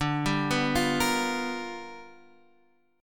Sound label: G 7th Sharp 9th D